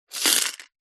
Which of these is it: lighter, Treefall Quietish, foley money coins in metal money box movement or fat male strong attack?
foley money coins in metal money box movement